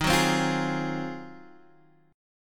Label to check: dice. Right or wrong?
Wrong